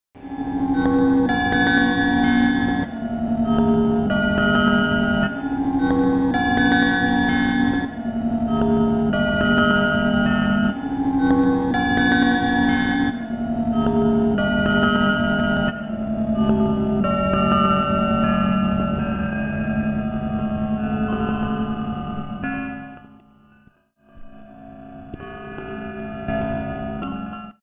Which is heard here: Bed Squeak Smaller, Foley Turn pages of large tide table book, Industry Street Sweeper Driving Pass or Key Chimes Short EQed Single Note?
Key Chimes Short EQed Single Note